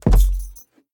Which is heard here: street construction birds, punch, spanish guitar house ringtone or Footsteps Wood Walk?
Footsteps Wood Walk